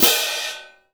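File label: cymbal, Orchestral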